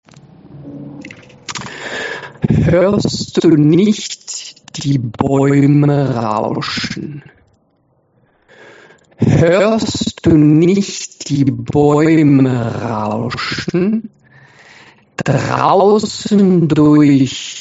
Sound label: SLOW; Lockung